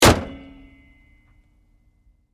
Truck GMC Savana Hood Close; Trucks; Vehicles; 2010 GMC Savana

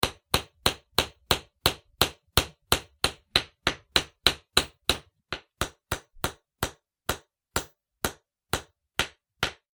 industrial tools hammer hitting nail; Hammer Hitting Nail Into Wood; Hammers; Hammering Nail In Wood

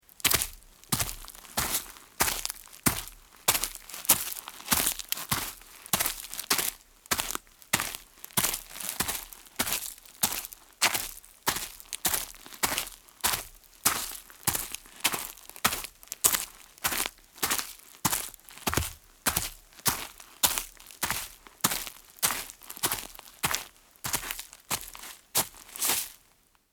Footsteps Dirt Ground With Leaves; Many footsteps on hard dirt ground with leaves on the ground; Environmental Sound Effects

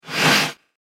Sheet Metal Swish, whoosh; Whoosh Sound Effects; Sheet Metal Swish Fx

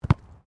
SOCCER BALL DROP KICK; Soccer ball sounds; Sports

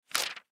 Magazine Page Turn; Paper; Materials; foley; Sound Effects:Materials; Blastwave

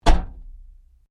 Boot Close, car; Hyundai Accent 2008; Hyundai hire car; Vehicles

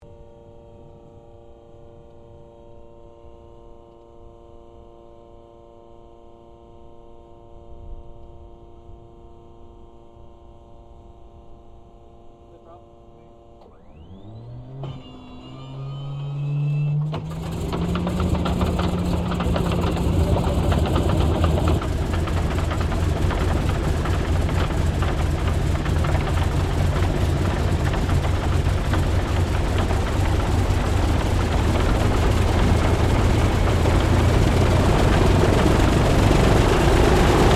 AIRCRAFT CA-13 BOOMERANG STARTUP STEREO; Airplanes (Sound Effects:Vehicles)

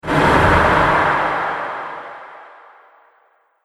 Epic Sf Explode Impact; Sci Fi Sound Effects

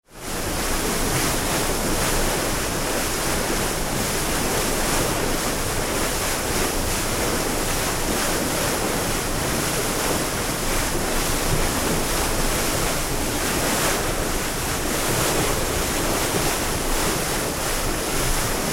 Ship Large Ferry Bow Wash; Tom Hutchings

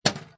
Pinball Sound Clunk; Video Game Sound Effects; Lynne Music

